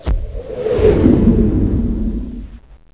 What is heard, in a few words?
Whoosh; Stanley's Sticker Stories; Edmark